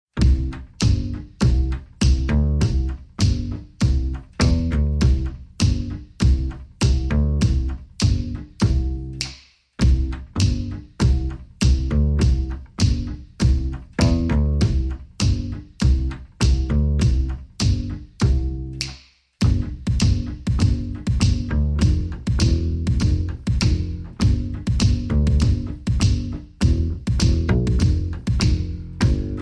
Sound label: Kick and Snaps and Bass